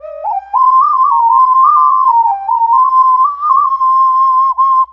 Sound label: Double Occarina